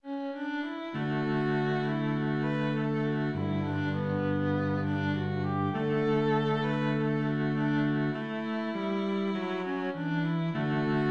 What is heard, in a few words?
violin cello duet